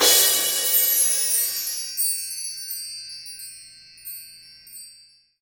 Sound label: normal hit finish; osu!